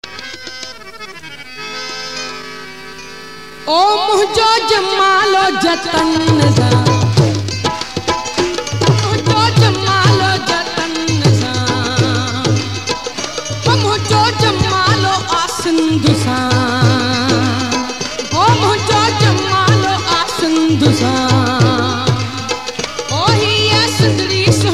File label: Best Sindhi Hojamalo